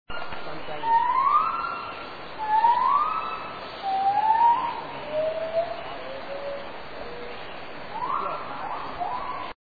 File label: gibbons; unicycling through laos